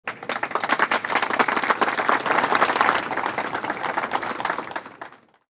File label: Small Applause; Levels Hub Mansion